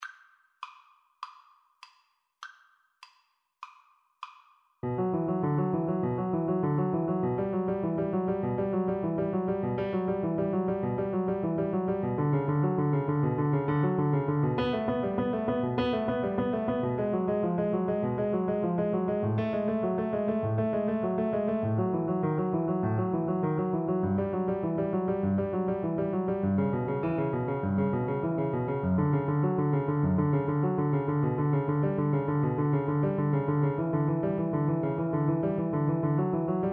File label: prelude c minor, Cello; bach